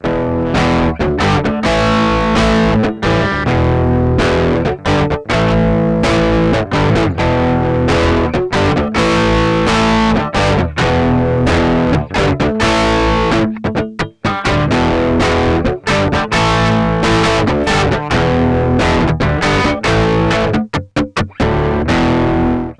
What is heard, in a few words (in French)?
electrique